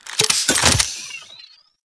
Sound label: Reload, Weapons; Warframe; Cedo Prime